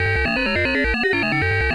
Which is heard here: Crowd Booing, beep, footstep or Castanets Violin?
beep